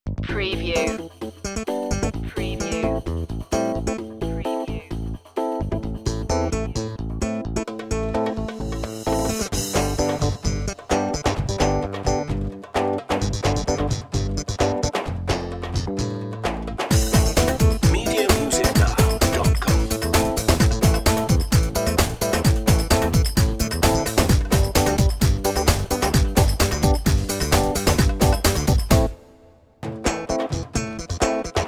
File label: egyptian dance no leads